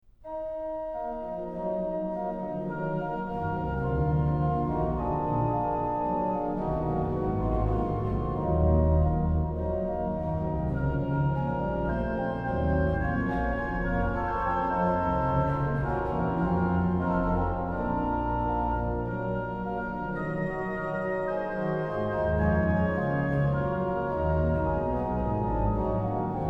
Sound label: ORGAN, Chorale Preludes; BWV0640; In dich hab ich gehoffet, Herr; BACH; BACH ORGAN WORKS